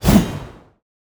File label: attack; Vasuki